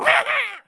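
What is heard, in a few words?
MON INSECT ATTACK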